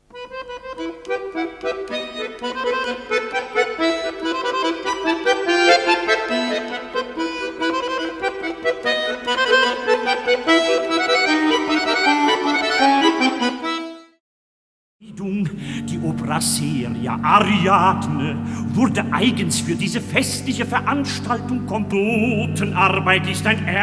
acordeón, armonio y órgano

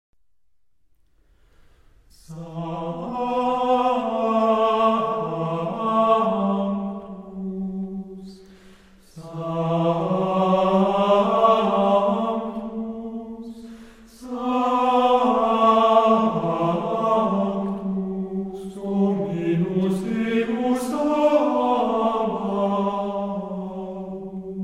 monofonía; textura

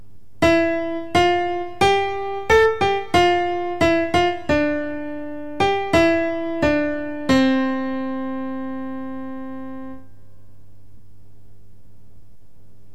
sonido fuerte

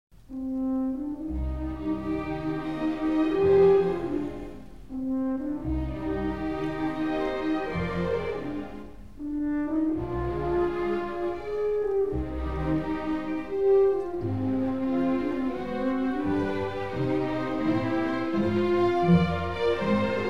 Trompa; Brahms

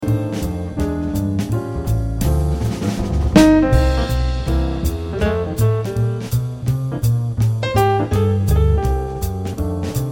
melodía acompañada

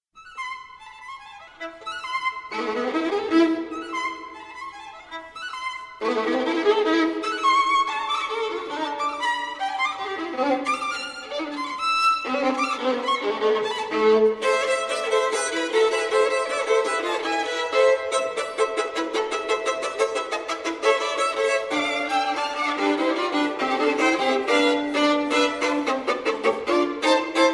Violín

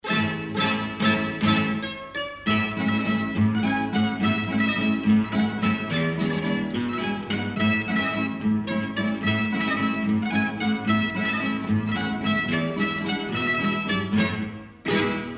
jotas navarras la jota mejor cantada

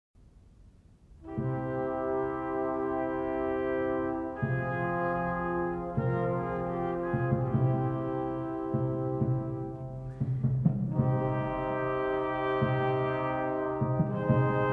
timbales; Purcell

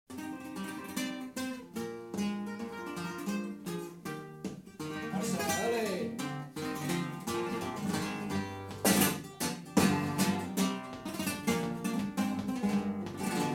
guitarra flamenca

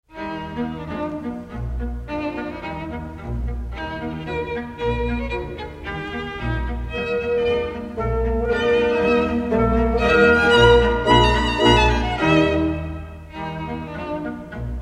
septeto

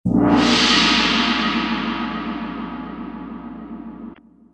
gong de chanes largo con platillos

